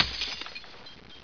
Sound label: glass break